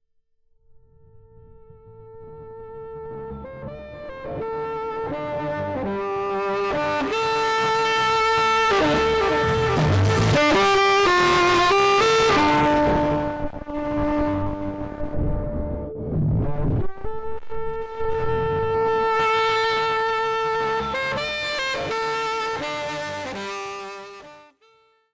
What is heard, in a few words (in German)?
vcf1 Saxophon Distortion mit Rückkopplung in den Eingang